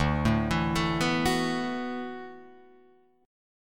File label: D 6th Add 9th D, Guitar, Drop D